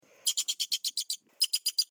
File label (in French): Cri Vison d Europe